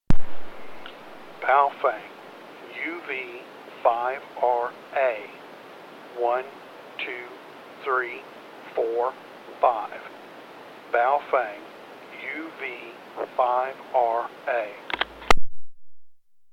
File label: baofeng uv5ra